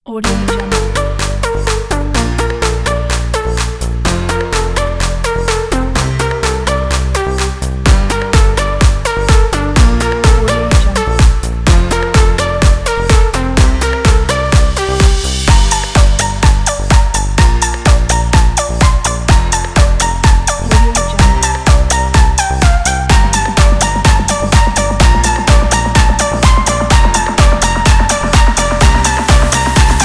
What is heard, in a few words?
upbeat corporate